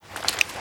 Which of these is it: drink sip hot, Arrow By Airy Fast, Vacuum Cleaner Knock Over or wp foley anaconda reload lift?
wp foley anaconda reload lift